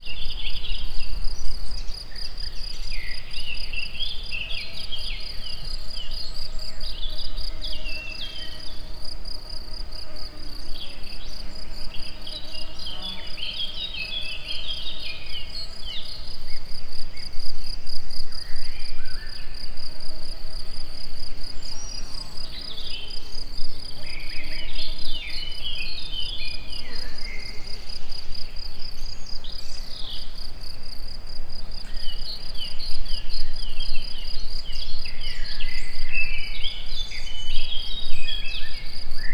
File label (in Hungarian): többféle rovar tücsök is; termeszetben; egerturistahaz